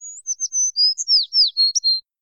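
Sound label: ambience bird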